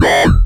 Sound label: Growl